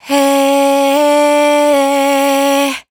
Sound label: riff Hey Bm, Dry Vocals; Raw Vocals; Slate Digital Omnivox Vocal Sample Pack